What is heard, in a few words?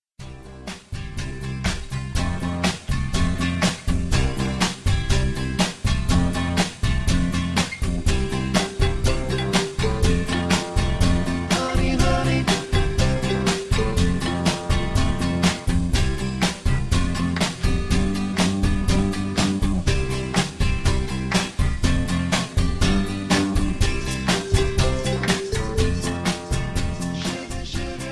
C Major Excerpt